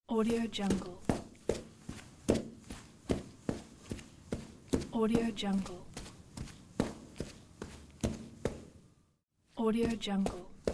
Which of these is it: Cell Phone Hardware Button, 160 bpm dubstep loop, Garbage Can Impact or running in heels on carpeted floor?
running in heels on carpeted floor